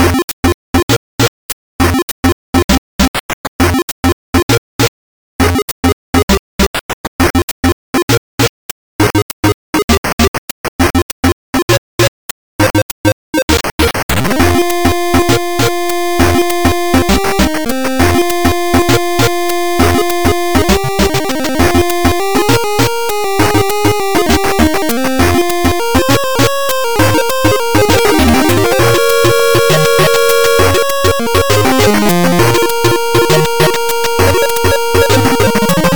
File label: Battlefield 8bit; Game Music